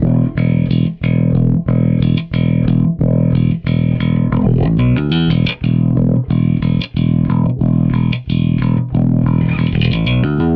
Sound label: Bass, Wah; Bass X